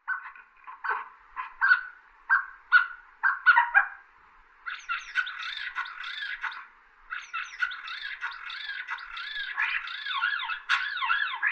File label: starlings